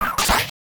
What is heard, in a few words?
Cut; Pokemon Essentials; PKMN Estelar 1.3